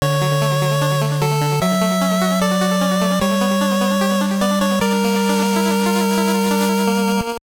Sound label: Game Over; Mega Man Maker